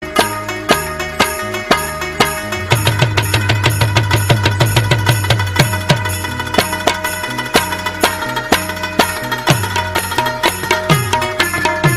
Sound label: rabab pashto ringtone